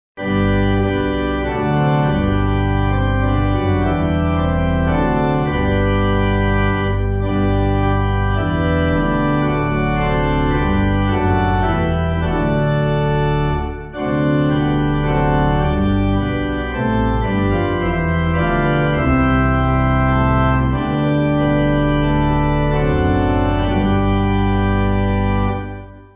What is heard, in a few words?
Pipe; Almsgiving